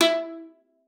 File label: E4, VIOLA PIZZ; Xsample VOL-5; 90 s Sample CDs